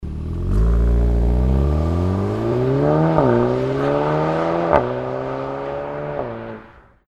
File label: VW Golf VII R OPF Axle Back System; REMUS